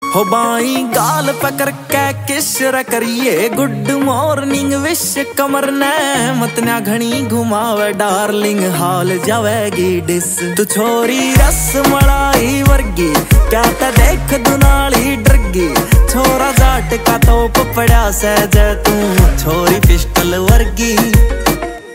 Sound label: Morning Wish Ringtone